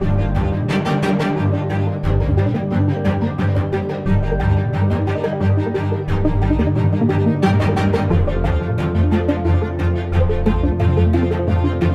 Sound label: loop Action